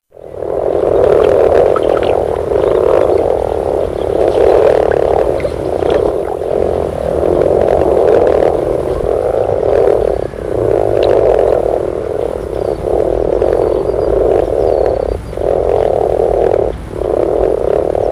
grenouille rousse

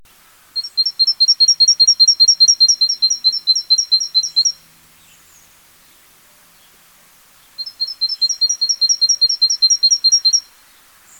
Mesange charbonniere

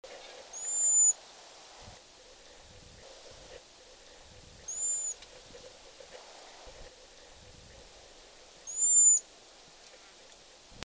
jaseur d'amerique

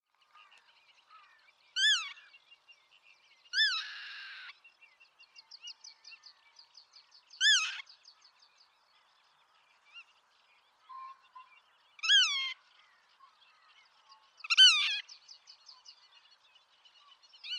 barge a queue noire, limosa limosa

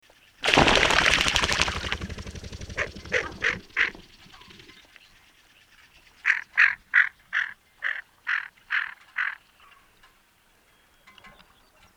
fuligule milouinan aythya marila nearctica

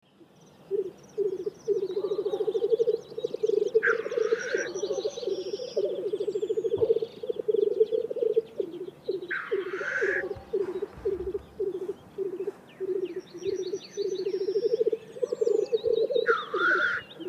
chant tetras lyre

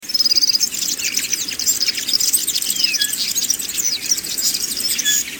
hirondelle de fenetre

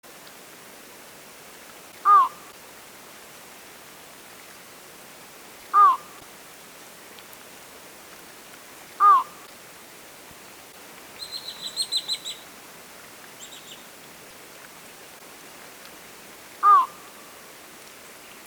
butor etoile

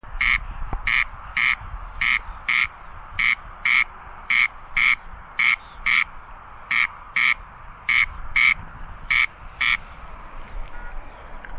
chant rale des genets

